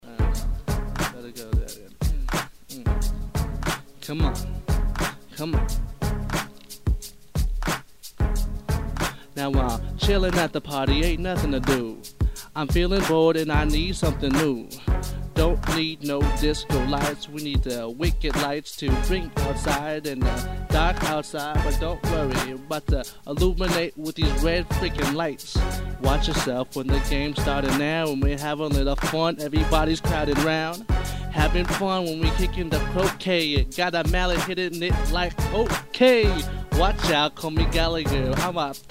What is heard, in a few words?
Rap Jingle